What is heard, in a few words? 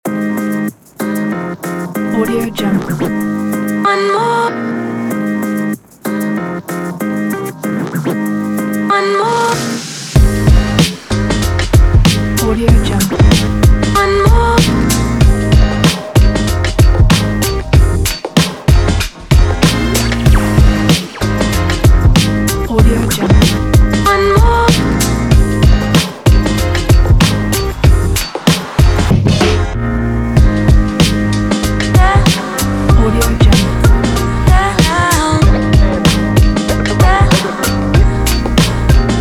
hip hop